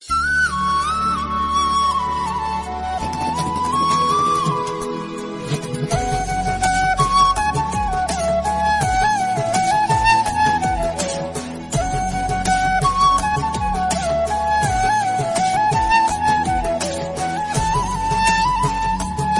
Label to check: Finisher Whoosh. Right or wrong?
Wrong